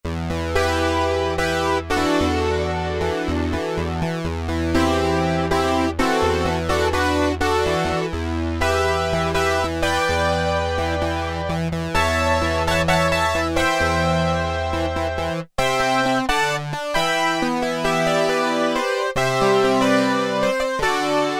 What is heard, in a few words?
Roland Juno-60 Phase Combination; Factory presets